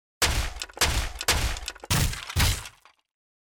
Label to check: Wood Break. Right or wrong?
Right